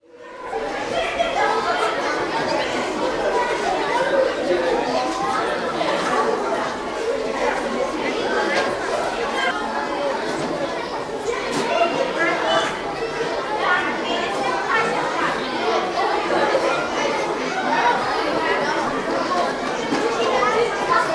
School Busy School Classroom, Walla; Sound Ideas; Walla Series

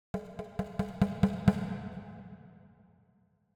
Drums Slow Hits - Increasing Slow Hits, Thin Drum; Hollywood Edge; sound designer's toolkit v2

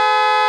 Car Horn Loop; Automobiles

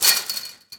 Fence Chain Rattle; Foley - Rattles

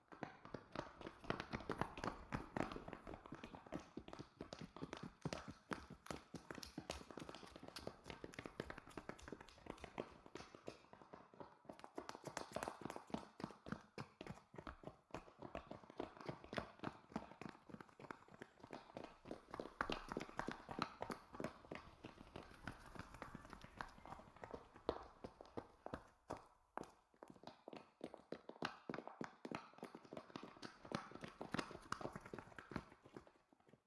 town amb walla running; Blind Drive

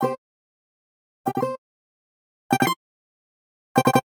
Digital Click Positive

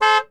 Truck Dodge Ram Horn; Trucks

